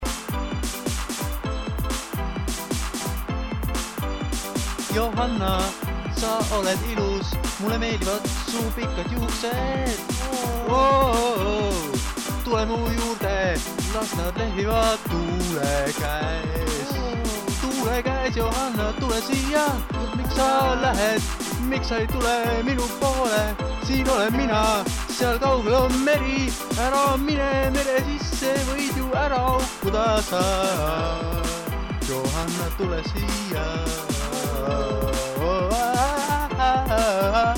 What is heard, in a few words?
Vocal Trance